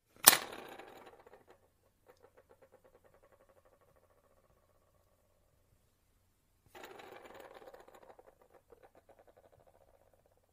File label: Dropping pencil; افتادن; افتادن مداد